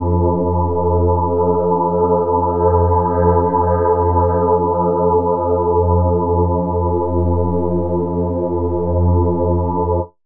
drone voiced F

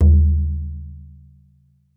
BODHRAN, Celtic Percussion

